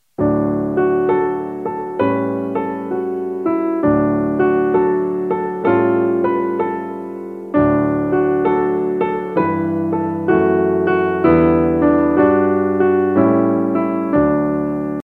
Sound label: piano